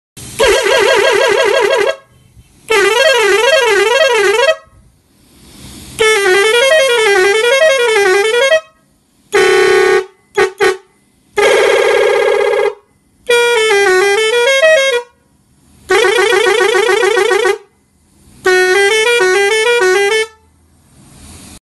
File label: Pakistani Horn